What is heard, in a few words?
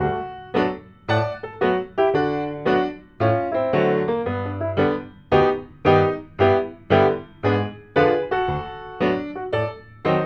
AMERICAN PIANO